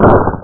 alien shot; Megahits 3; offender

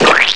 Squish; Apeiron 1.0.2; Apeiron Sounds

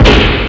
MISSLE; evilcore

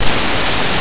Noise

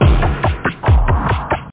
seal, drums, misc

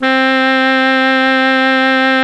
Tenor Sax, Reed; gmtones

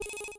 Beep; Earth and Beyond; EA Games

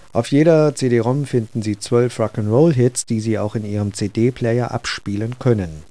rock

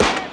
woodwrk

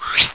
whhheeep

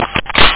load wea

